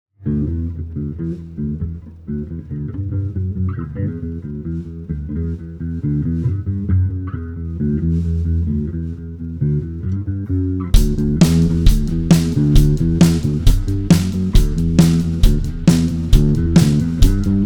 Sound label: test warm up